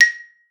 A#5, xylophone; Xsample VOL-4

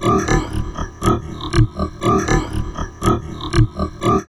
VOCOD, Drum Loops Crazy Processed

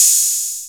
808 O HAT; Rubber Chicken Software Sample Bank; DRUMS + PERC

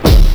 SLAM, Hip Hop Misc Perc; E-MU Producer Series Vol. 8 – Platinum Phatt

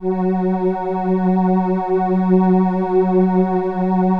LAMBDA, String Machines

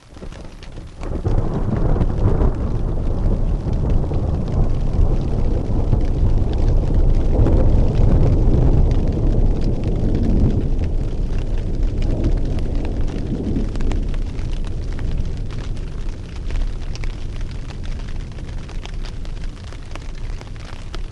Weather, On The Glacier

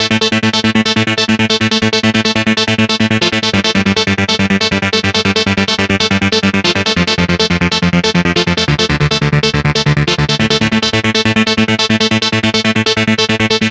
NRG Arp, trance

